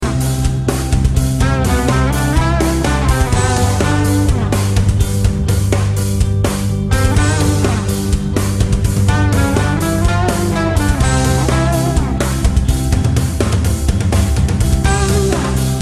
Barracks Tune

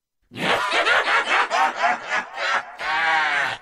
Evil Laugh; Black Ops

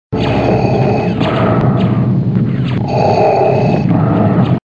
Vader Breathing

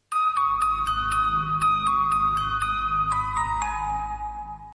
mtv-1 signal

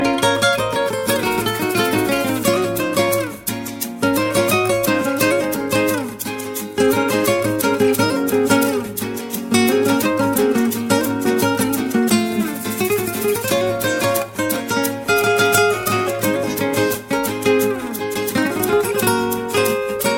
Iranian Guitar Song

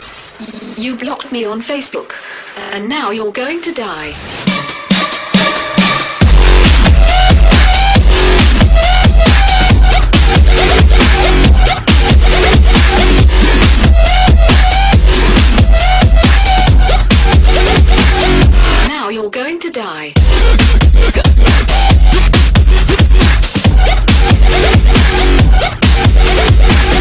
Hard Drop

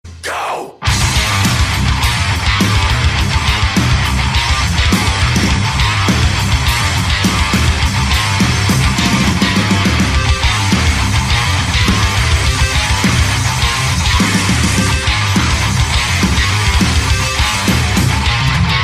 Metal

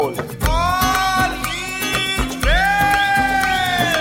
Msg Tone

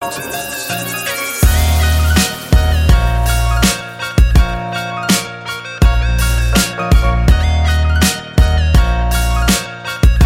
Westcoast Hip Hop

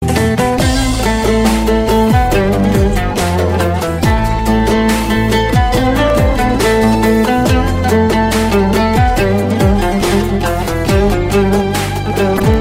Turkish

